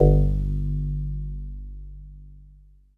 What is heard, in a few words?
Jazz Harp Kawaii G0; keys